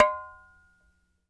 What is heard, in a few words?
Clay Pot mute; Sony CS